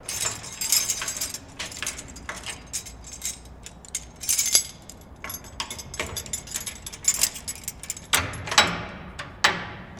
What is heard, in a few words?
Key Mvmt Into Lock, lock & keys; Key Movement and Into Lock